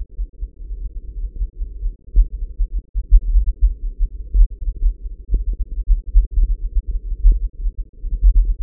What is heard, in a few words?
hum